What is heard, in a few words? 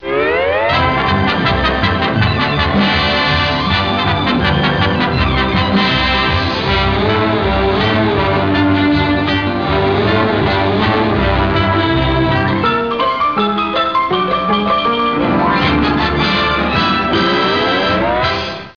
looney; baseq2